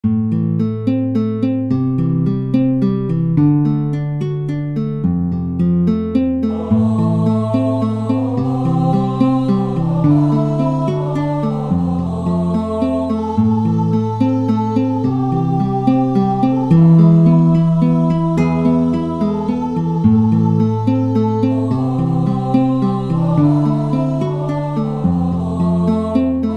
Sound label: House of the rising sun GUIT, guitar